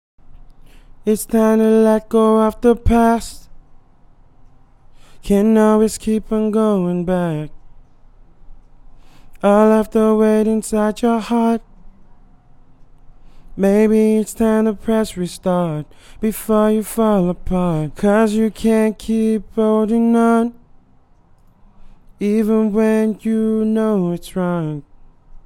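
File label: pop 115 D minor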